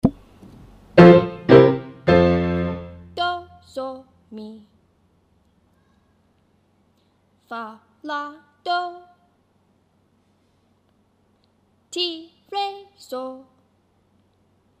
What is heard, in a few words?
concert f do tonic dominant and